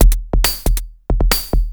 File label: percussion loops, Techno; PS-45 WAV Techno ID